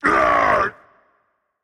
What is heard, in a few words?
grunt hit rage; chaac